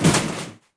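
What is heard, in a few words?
metal collapse medium; objects; Star Wars Obi-Wan; XBOX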